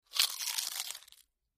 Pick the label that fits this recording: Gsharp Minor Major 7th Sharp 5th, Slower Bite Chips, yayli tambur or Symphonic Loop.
Slower Bite Chips